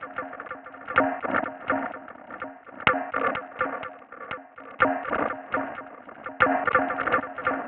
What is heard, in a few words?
125 Complex, Percussion; Loops; Percussion (Mono); Touch Loops; ElementsOne; AbstractRhythms